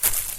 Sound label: leaves dug; everness